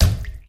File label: bathtub step; Golden Crayon Sounds